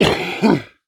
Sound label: Cartoon Human Male A Giants Sneeze; AdobeCC